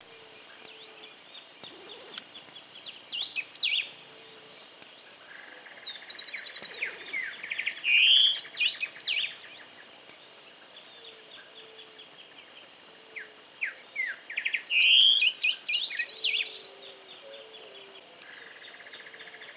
Pepitero Gris Voz; aves

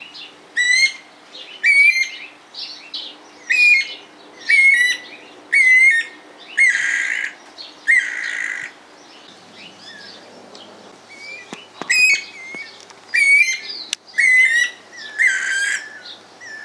pirincho voz, aves

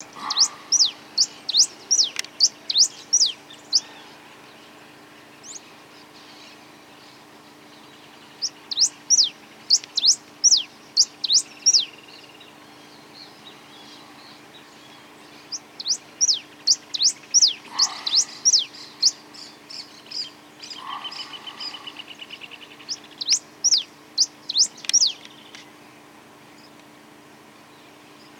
Siestevestidos Voz, aves

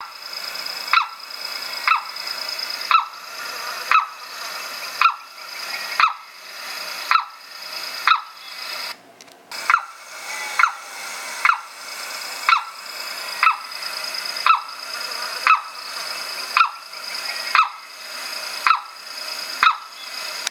carau voz; aves

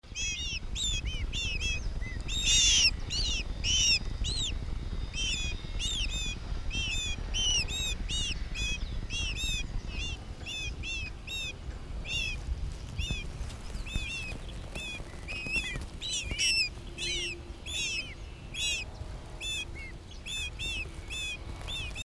Benteveo Piar de pichones chicos; aves

